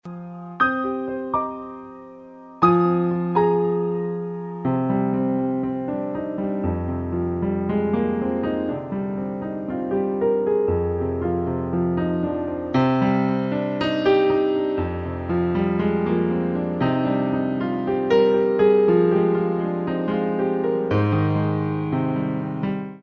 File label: piano 2nd verse